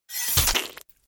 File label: slice